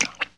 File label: eating chew; MiniGames